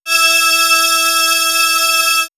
Swirls, pads; X-Static Goldmine

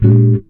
GTR CHORD; Univers Sons; Jazzistic

